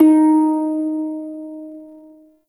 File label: Prime Shawzin Oct Two D Sharp; Warframe